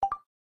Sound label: Panorama Shot; Casper Via-M1